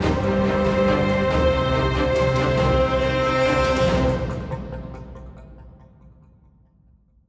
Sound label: Player Defeat End